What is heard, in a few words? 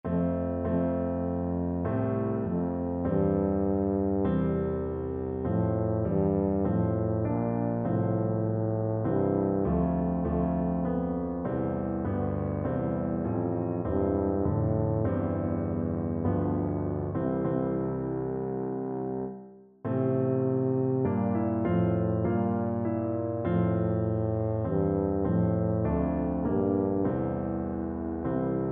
o come, tuba